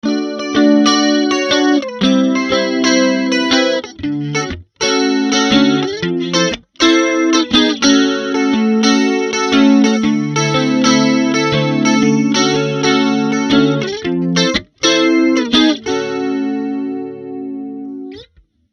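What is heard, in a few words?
Fame Classic III Middle + Bridge Pickup, guitar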